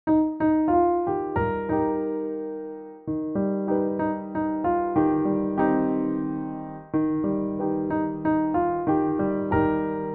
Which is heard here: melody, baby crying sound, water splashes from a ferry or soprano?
melody